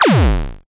sound design digital synth hit blip thud; zapsplat

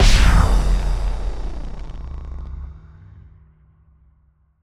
Impact Boom Distorted; bottlerocket

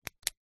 Bic Pen On

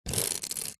household hairbrush scrape bristles on wood

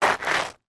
footsteps scrape scuff feet gravel ground; foley

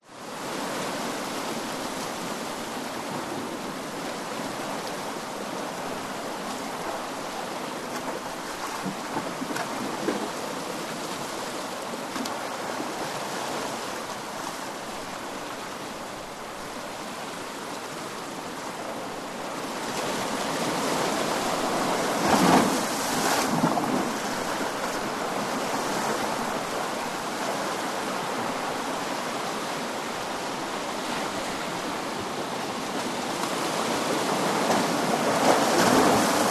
nature ocean waves surf wash up over rockpools

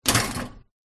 A Toaster

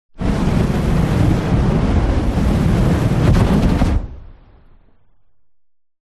Flamethrower Long Heavy Single; WEAPONS; smartsound

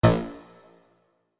sound design metal hit low pitched

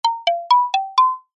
cartoon footsteps short sneaky wooden mallets; zapsplat

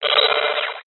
science fiction radio signal interference burst

